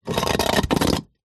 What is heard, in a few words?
foley concrete block heavy move scrape concrete ground